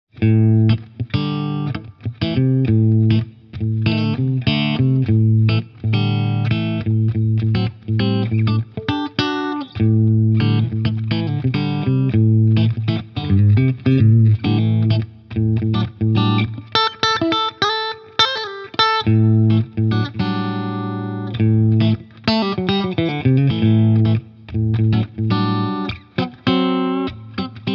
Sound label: Honey Comp Stratsound Comp Dry; Carl Martin